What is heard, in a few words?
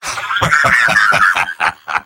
Engineer laugh happy